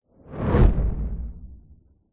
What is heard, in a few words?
sound design whoosh flames fire cinematic; zapsplat